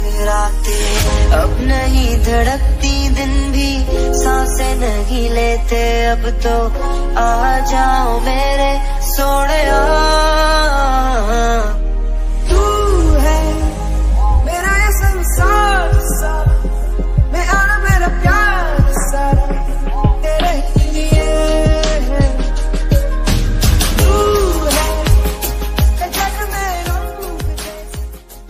bollywood ringtone; bollywood ringtones

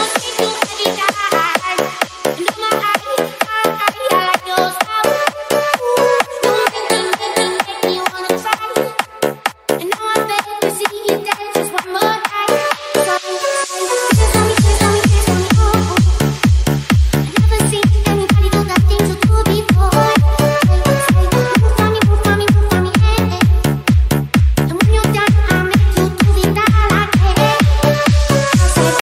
shuffle dance ringtone; choreography; english ringtones

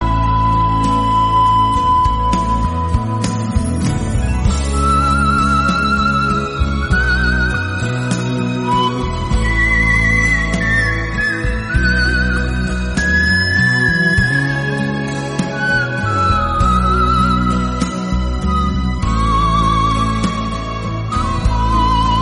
classical music theme tone ringtone

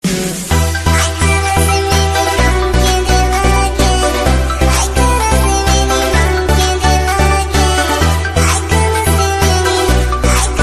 status music ringtone, bollywood ringtones

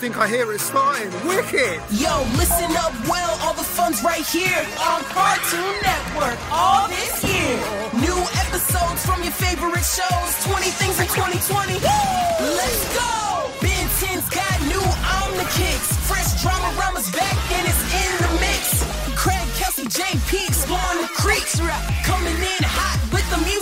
music cartoon ringtone

